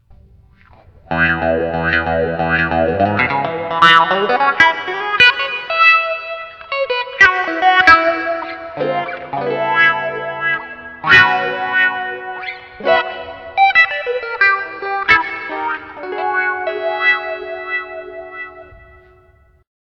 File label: auto wah, Boss GT-8